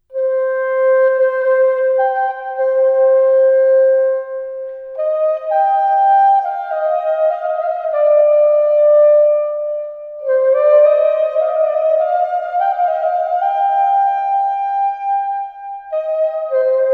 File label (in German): kuhhorn hirtenmelodie; musikinstrumentenmuseum